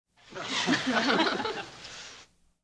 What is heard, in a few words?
crowd small audience